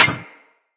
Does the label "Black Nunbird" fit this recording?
No